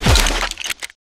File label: Crates n Boxes, destructibles